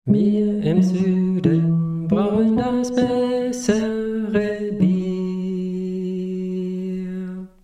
Gesang Bass

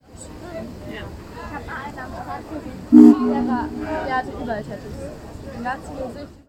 Dampffahrt

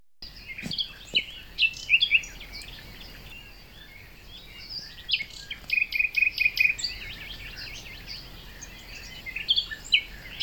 nachtigall; hunsrueck

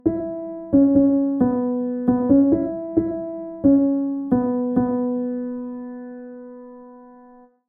Klavier Tenor

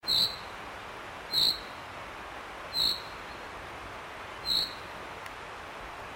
buchfink regenruf; paklenica

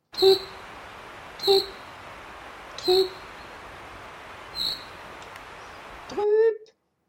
buchfink regenruf annotiert; paklenica

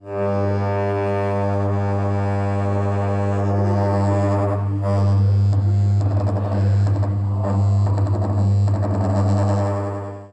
Fussmassage

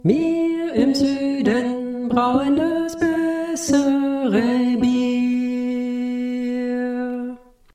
Gesang Tenor

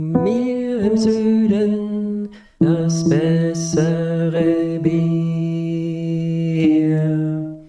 Gesang Tenor

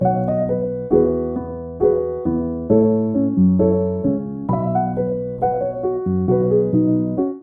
Klavier Solo

